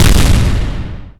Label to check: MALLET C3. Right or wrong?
Wrong